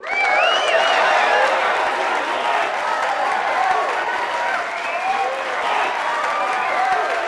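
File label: Boxing Crowd Small Cheer Loop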